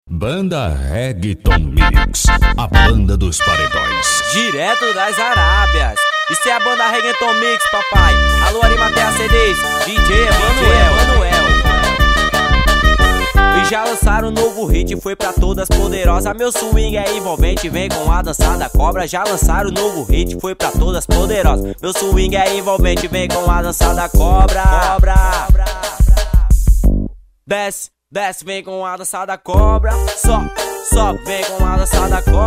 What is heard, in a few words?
reggaeton mix